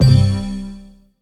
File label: Error; Treasure of Nadia